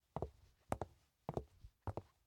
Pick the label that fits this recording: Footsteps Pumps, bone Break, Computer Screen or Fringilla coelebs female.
Footsteps Pumps